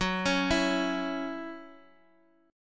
C sharp Minor Double Flat 5th F sharp, chord; C#mbb5/F#; arpeggiated; JGuitar

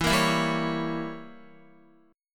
E Diminished 7th E, Guitar; strummed

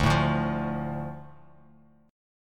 D Minor D Bass Standard; Dm strummed; JGuitar

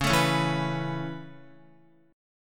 Db Minor 7th Sharp 5th Db down Guitar; strummed; 4E,4A,5D,5G,5C,6F

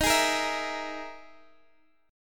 E Major 7th Flat 5th chord

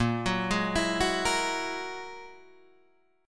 G sharp 7th Suspended 2nd Sharp 5th

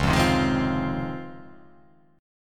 C sharp Major 9th

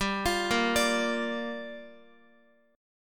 G Minor 7th, Guitar; Gm7 arpeggiated; JGuitar

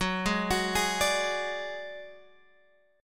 Gb Suspended 2nd Sharp 5th, Guitar, Open G; down; chord shape

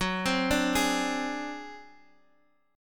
Ab 7th Flat 5th Gb, guitar chordshape

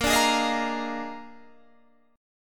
Bb 11th, Guitar, Drop D

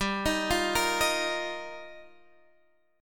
G Minor 7th Sharp 5th, Guitar, Drop D; chordshape; down

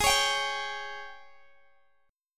Ab Major 7th Suspended 4th, Guitar, Open G

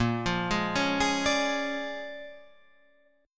A sharp 7th Sharp 9th, guitar chord; down

